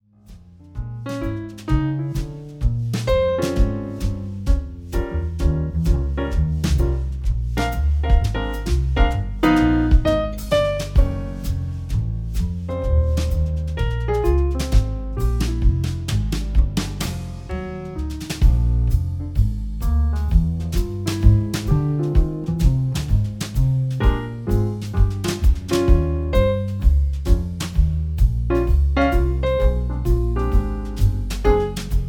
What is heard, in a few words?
jazz trio blues